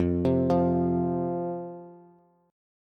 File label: F 5th F, down, Bass; F5 arpeggiated